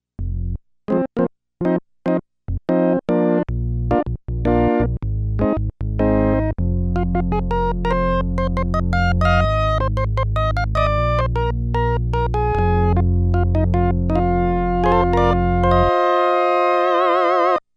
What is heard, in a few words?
Organ